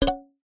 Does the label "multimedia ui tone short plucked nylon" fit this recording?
Yes